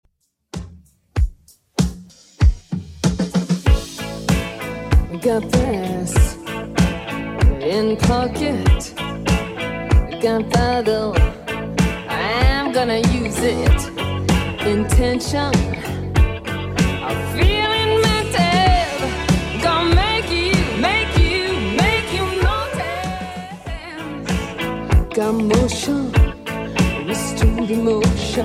70s Redrum 96bpm

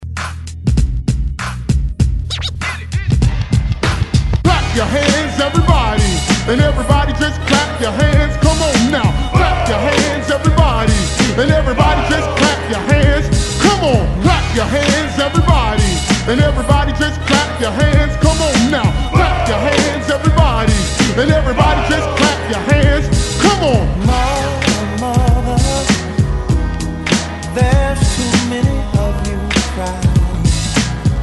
70s Hype Re Drum 101Bpm

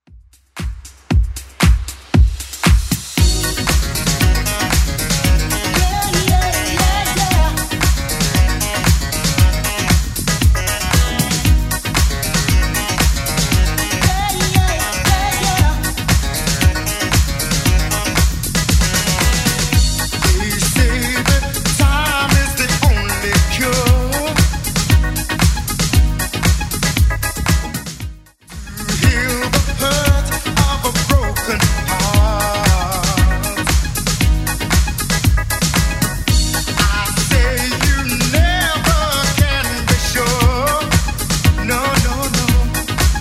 80s Club Edit 116bpm

